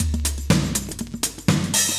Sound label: chique 120, loops, 120bpm; Sound Effect Library